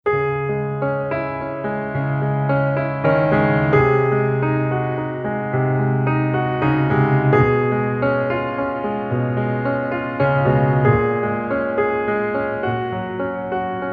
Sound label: Peaceful Piano